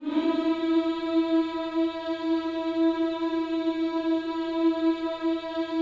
HMMS E 4 E, gospel hmm; Spectrasonics; Vocal Planet Vol.1 Gospel; akai

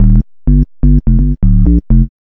B LOOP

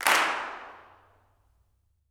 CLAPS, Gospel; Spectrasonics; Vocal Planet Vol.1 Gospel; akai

